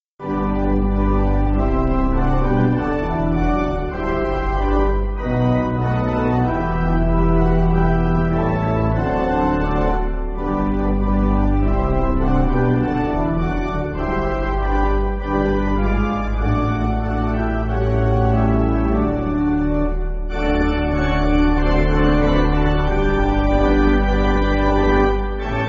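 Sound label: Pipe Organ, Music Backing; How You Will Love Him; Ackley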